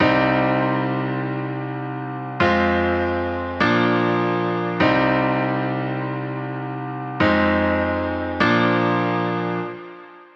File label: Dancehall Afrobeats